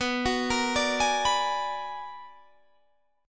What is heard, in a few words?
B-Minor Major 13th, Guitar Half Step Down